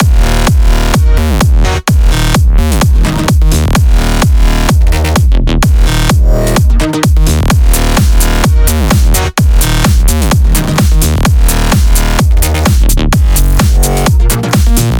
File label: 128BPM Mix; Vengeance Samples; Vapour Kit